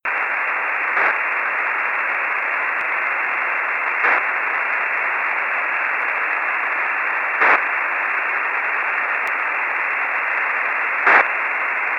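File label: UWE, satellite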